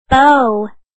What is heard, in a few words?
bow